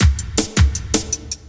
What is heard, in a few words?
LOOP, Drum & Bass, 160 BPM